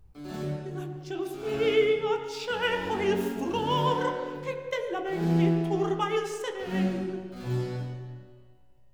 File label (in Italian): Recitativo; La gelosia, cieco il furor; Terpsicore HWV 8b